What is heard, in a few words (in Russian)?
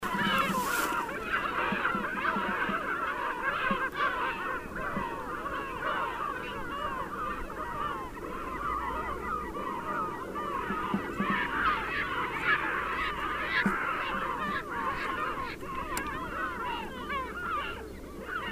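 hohotunya golos, Caspian gull voice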